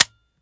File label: fire first; gmod; viper